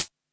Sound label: Quasimidi 309, Drums